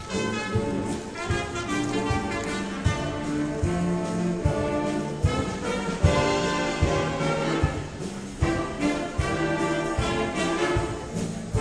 jazz; stolperstein

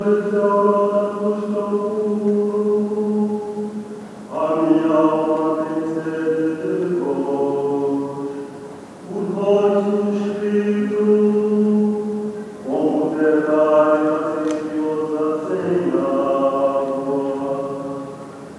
chanting

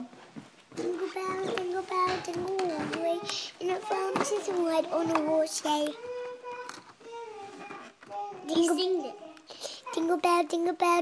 jingle bells

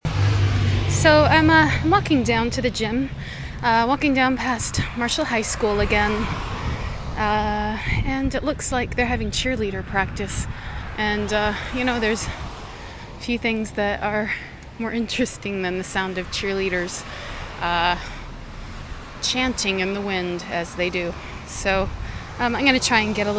cheerleaders cheer; another stroll in the neighbourhood

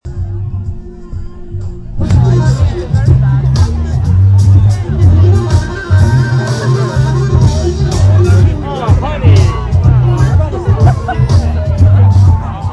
beer and jazz festival; windsor

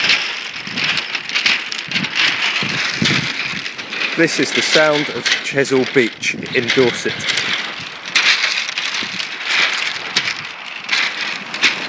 the sound of chesil beach

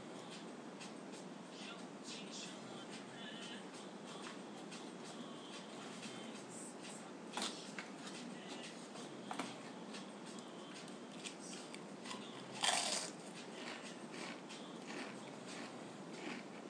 friend snooring on my bed and i m eating chips

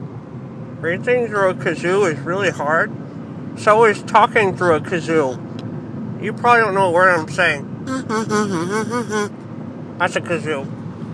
kazoo

